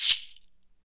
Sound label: SHEKE, Afro Shakers, Shekeres